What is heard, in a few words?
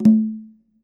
reels start; Beauty Fruity; wazdan